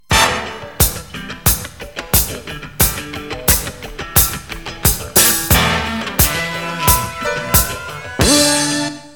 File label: FUNK; L'Effet Pommier - Volume 04; MUSIC